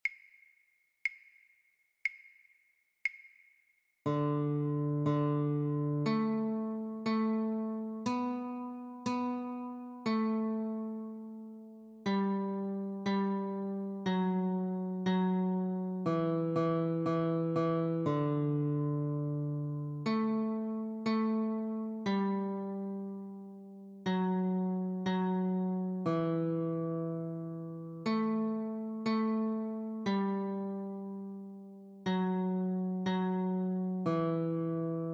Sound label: Notes only, Guitar